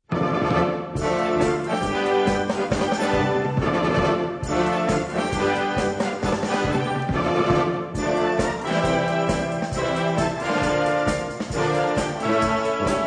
Sparkling Drums

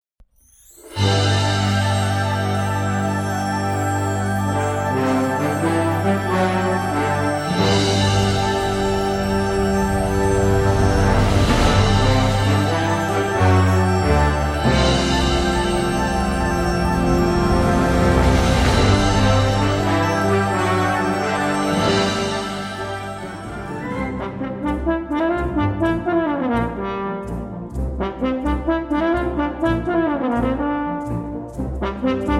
Blue horn

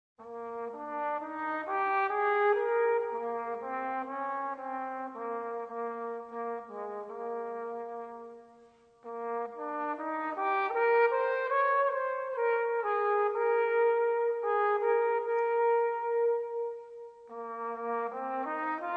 Folk Air; Airs d Ambiance

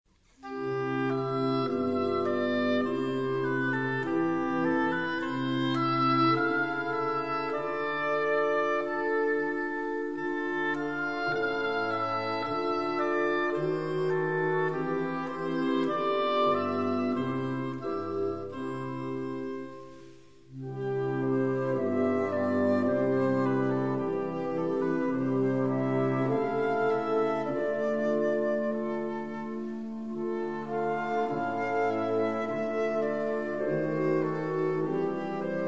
Three Russian Folk Songs

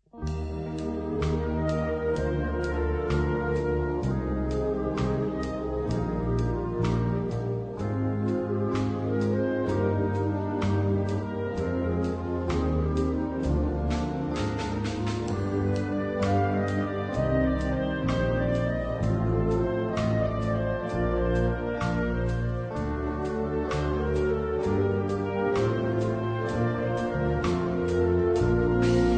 Choral And Rock Out

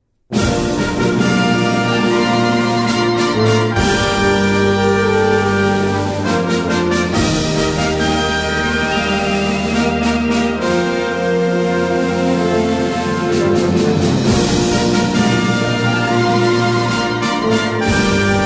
Fanfare Festive

